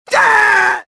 death; luke

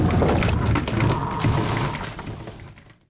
coll ship 2 ship; shipcharge; Pirates Of The Caribbean

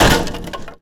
wood pole, Impact; Cars; XBOX

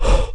ranger pant out

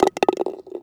ball in cup; Tony Hawk's Project 8; XBOX; houses

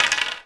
bouncy wood pole hit; bouncy objects; Tony Hawk's Project 8; XBOX

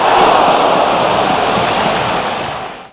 ooh, Crowd; David Beckham Soccer; XBOX

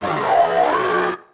droid gonk die

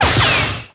lightsaber deflect laser; weapons

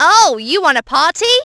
party

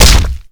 bail slap; skater; Tony Hawk's Project 8; XBOX

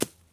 ball bounce rough deep Bounce; golf; ProStroke Golf World Tour 2007

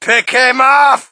crowd, shout; Mike Tyson Heavyweight Boxing

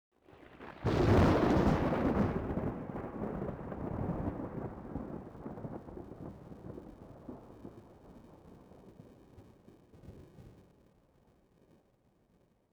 thunder strike; Soldier Of Fortune II Double Helix; XBOX; ambience

